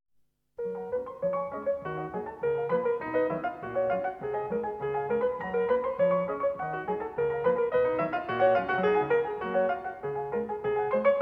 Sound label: Etudes G flat major, piano